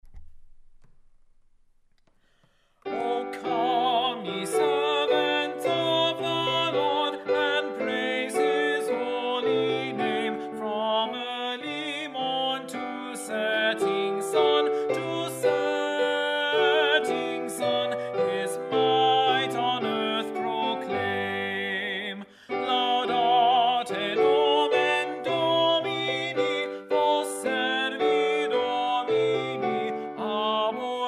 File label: Tenor; O Come, Ye Servants of the Lord, C. Tye